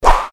Fighting Swing Sound; FXHome

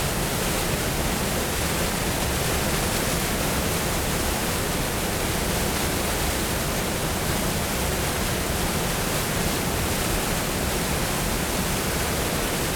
WATER, Dam - Large stream splashing; Mononeshot

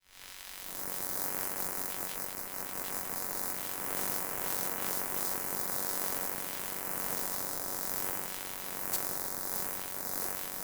Flowing Current, Electromagnetic Design

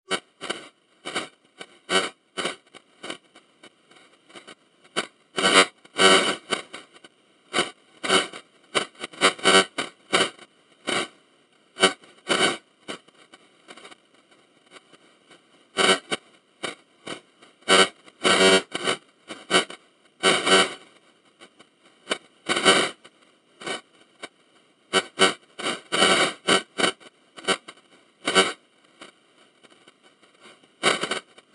noise radio signal; Signal Communication; Bluezone Corporation

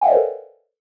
Tech, Weird Side Samples

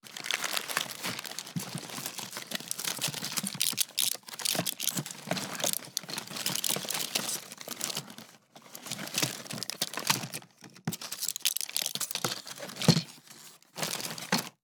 Medical Box, Rummage; SoundBits

